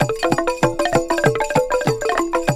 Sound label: AFRIQUE 97, percus loops